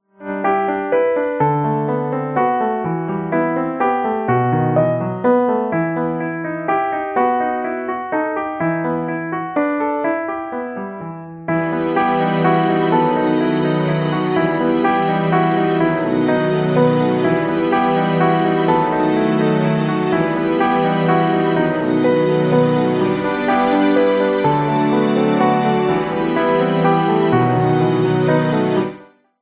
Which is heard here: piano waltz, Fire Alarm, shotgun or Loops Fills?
piano waltz